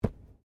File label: Body movement Organic Impact Light; Body movement foley to simulate falls and deaths; Wrestling And Struggling Sound Effects